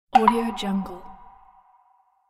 button tap

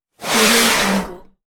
sliding sheet metal

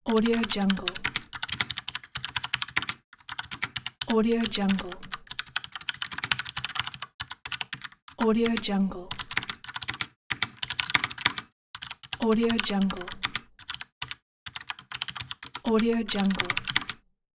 typing mechanical keyboard

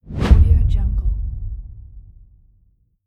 swoosh boom

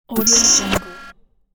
neuro glitch

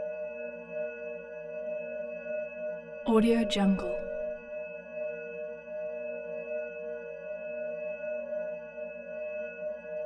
synth motor accelerate

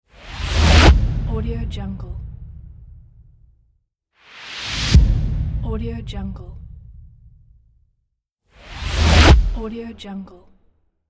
futuristic swoosh impact

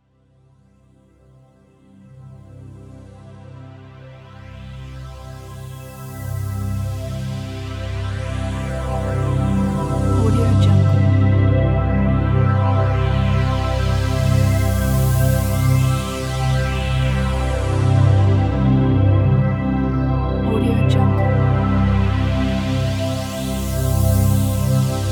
uplifting ambient transition

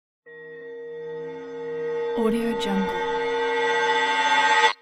bell reverse

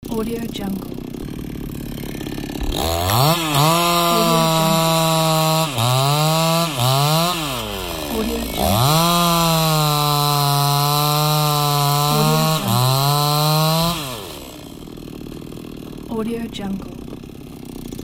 chainsaw loop

